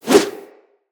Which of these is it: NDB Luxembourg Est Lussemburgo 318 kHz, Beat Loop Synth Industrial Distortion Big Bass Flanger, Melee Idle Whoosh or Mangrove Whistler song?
Melee Idle Whoosh